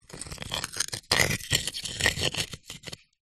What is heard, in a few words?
nature ice pieces thick scrape together